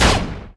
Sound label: Thermal Rifle Hit; lancer weapon fx